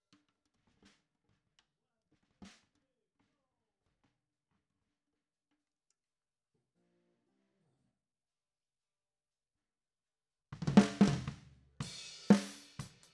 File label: SNR TOP